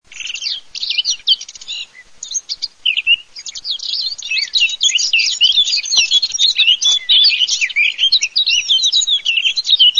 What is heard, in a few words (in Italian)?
CAPINERA CAP